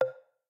empty screen area; VoiceView

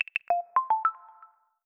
Windows Proximity Notification

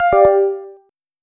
media remove; Linux

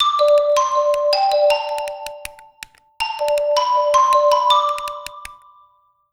News Flash, iPod sounds; i OS

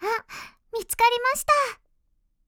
windows logon; Madobe Yuu

